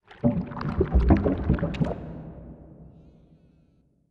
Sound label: Creature